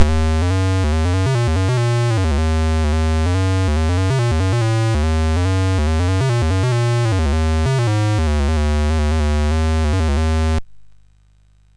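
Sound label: Oisac, MOS 6581 SID chip music, games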